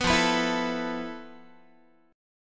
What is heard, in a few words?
F Major 7th Flat 5th Guitar; strummed